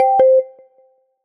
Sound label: Drop In End Tone; iOS Sounds